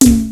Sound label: SPD-8 KIT